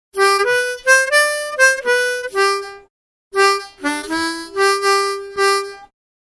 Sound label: Lick; mundharmonika